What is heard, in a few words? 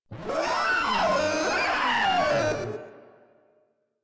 Proto Infested Runner Vocal Attack; Warframe